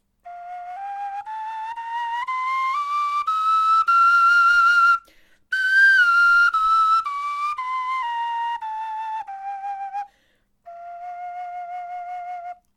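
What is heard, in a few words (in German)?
tonleiter, knochenflöte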